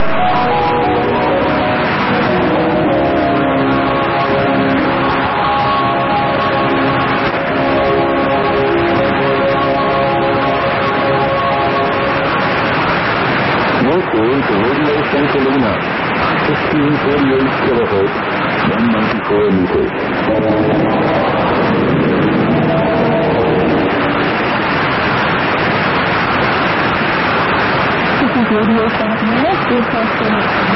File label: St Helena 11.0925MHz